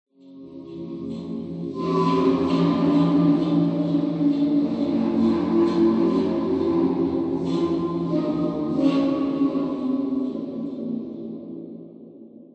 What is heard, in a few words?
Jazz